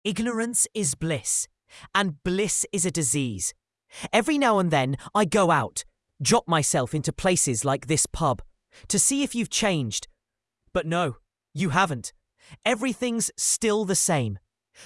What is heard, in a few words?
ballad british